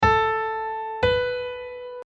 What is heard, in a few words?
Interval 8